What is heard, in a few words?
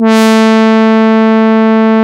LEAD, Jupiter Brass; E-MU Producer Series Vol. 2 – More Studio Essentials